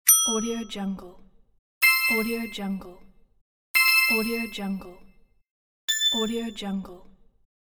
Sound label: great idea light bulbs